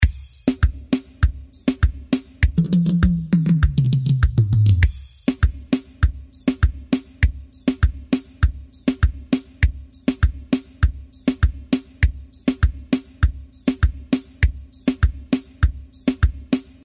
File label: FORRO SERTANEJO BPM100